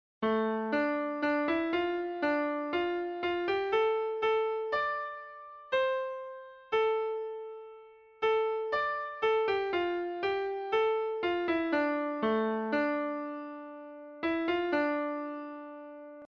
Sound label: Hymns